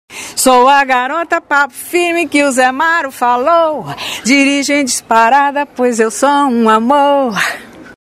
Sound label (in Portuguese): vinheta cantada